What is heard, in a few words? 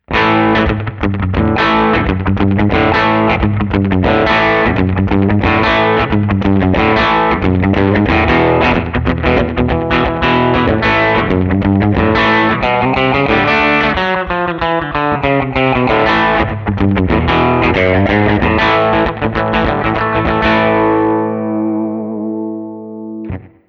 Guild SFIII Rockabilly; 2016 Guild Newark Street Starfire III